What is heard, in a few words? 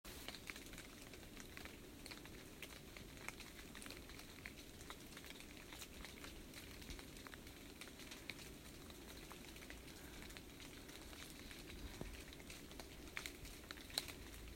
Rain On Tow Hill